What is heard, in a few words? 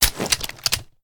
drop, sh; reloads; iw8; romeo870; gmod